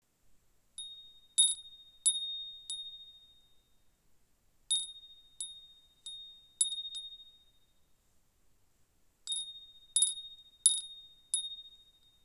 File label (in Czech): furin mini, zvonkohra skleněné furin bell feng shui sakura